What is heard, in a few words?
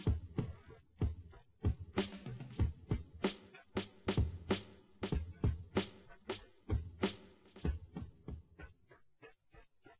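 with drums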